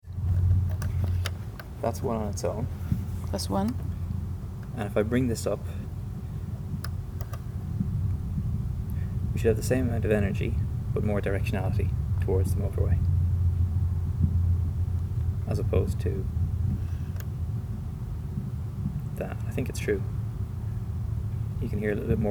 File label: phased array; 12 m